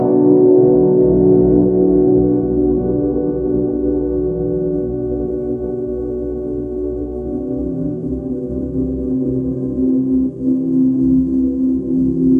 ethereal call soundscape; ai

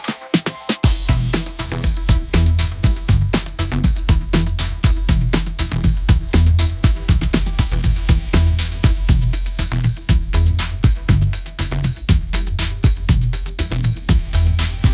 electric heartbeat groove, ringtones; ai

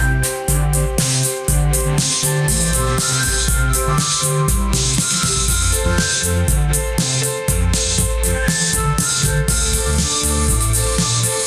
pop groove

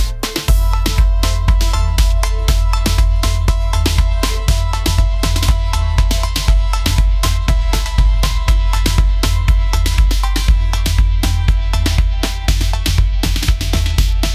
pop reggaeton

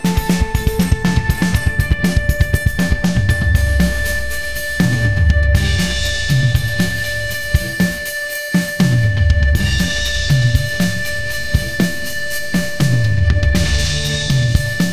upbeat synth strings, ringtones

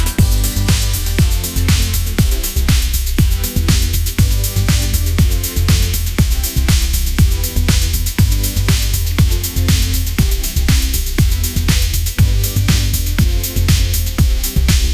bright lights pop anthem

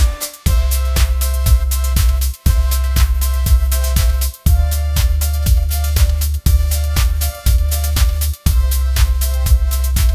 upbeat pop melody, ringtones

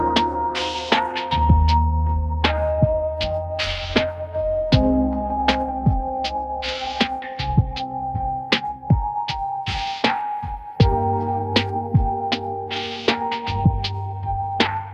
dreamscape lofi atmosphere; ai

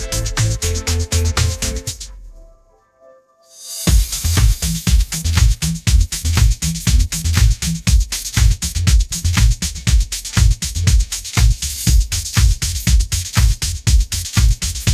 pop groove; ai

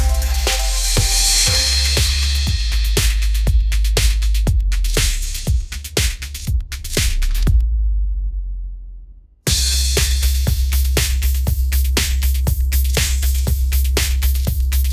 bright synth pop groove

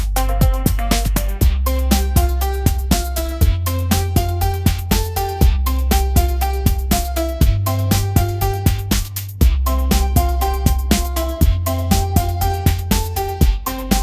pop groove

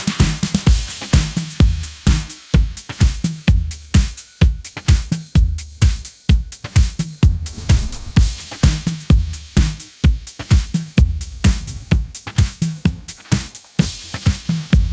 electric groove festival, ringtones; ai

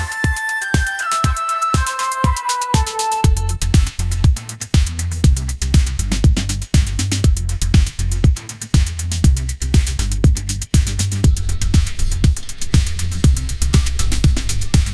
pop anthem

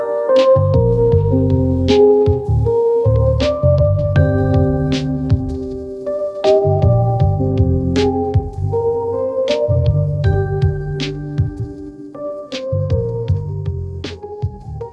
gentle rise lofi piano, ringtones

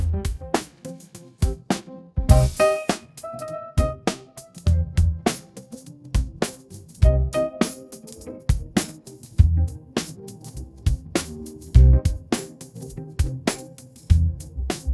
midnight swing jazz quartet, ringtones; ai

